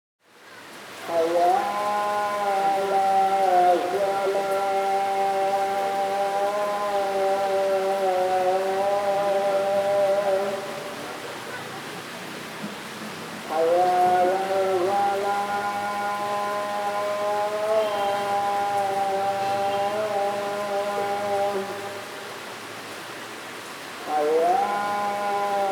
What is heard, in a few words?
Muezzin and river, Bangladesh; Les Cartes Postales Sonores; NOISE ON EARTH Vol2